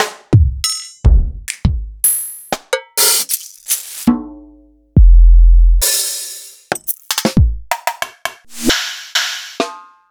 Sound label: EMU Orbit